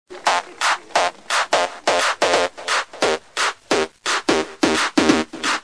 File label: Mambo loop